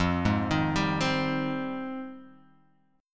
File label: F Minor 9th, F, Guitar, Open G; 3,1,1,0,1,x; down; chord shape